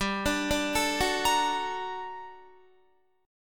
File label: G Minor Major 9th, down, Guitar; GmM9 arpeggiated; JGuitar